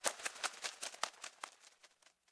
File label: Ladybug Flies